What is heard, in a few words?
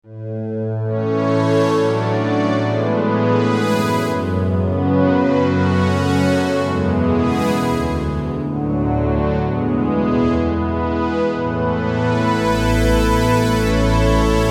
Deform Sin Pad, v-synth